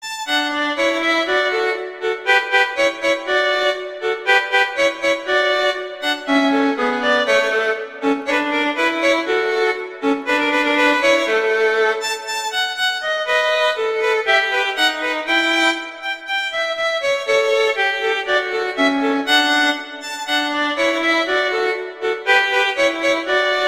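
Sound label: Duet, violin, viola; Mozart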